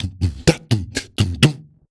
Percussion Loops, Brazilian; Houseworx

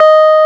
PUNCHREEDS; Wizoo - Powered DX

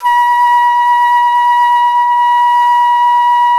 TRAV B5, traverse; SoundBytes - Early Patches

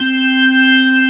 ORG C4, ORGAN B3; Best Service ProSamples vol.55 - Retro Sampler; AKAI

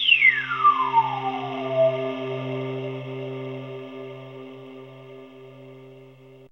SYNTH WIZZ

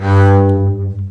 CBS G2 MARCATO, String Collection; East West - The Ultimate String Collection

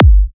G, Kicks; markus hakala

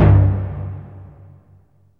fm tympani, single shots; Sonic Foundry (Sony Creative Software); Ugly Remnants VOL-2; Beatstuff

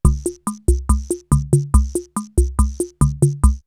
CR78 R77 BeatBox, drumloops, DrumMachines

